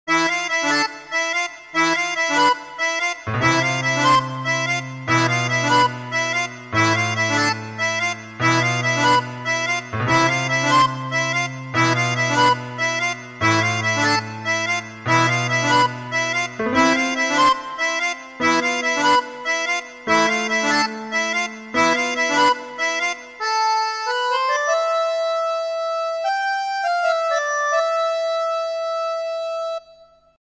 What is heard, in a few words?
Argentina